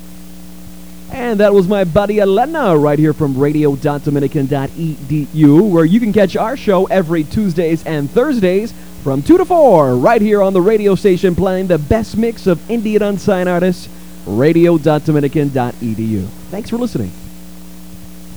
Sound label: old vocals